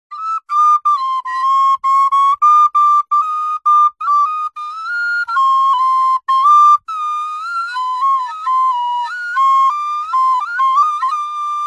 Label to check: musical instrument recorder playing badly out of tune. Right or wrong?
Right